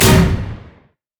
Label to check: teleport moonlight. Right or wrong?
Right